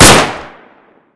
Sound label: Colt 1911 Fire, pistol, gun